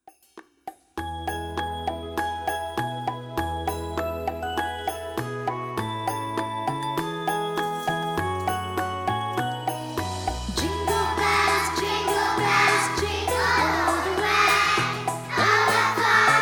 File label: Jingle Bells; Reception